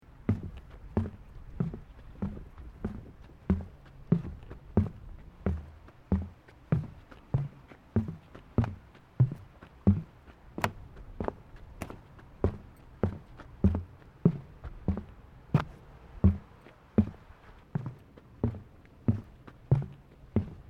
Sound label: Footsteps Boots Bridge Wooden Sequence; Footstep Foley; Footsteps (Sound Effects:Human)